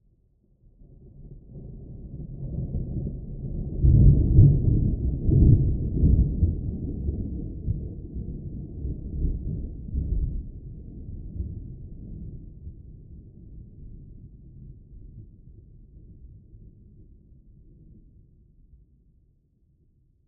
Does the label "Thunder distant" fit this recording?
Yes